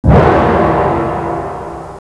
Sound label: GONG